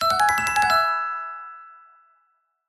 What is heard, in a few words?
multimedia alert warm bright bell piano positive event; zapsplat